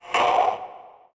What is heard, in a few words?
Vocal Pain; Warframe; PriestQuest; PossessedRedVeil